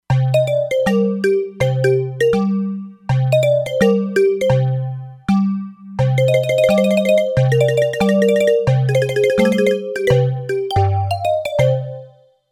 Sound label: Timba Mallet, E-mu Proteus-2; Factory Presets